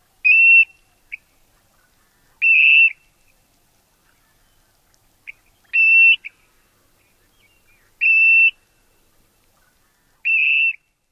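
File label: kreeek or kree-eek calls, Clark's Grebe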